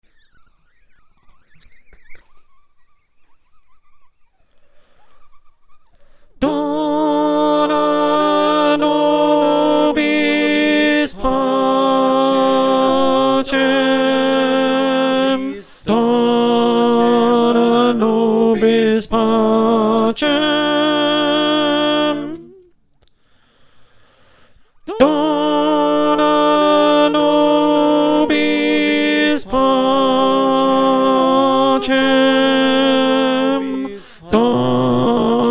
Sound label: Alto, choir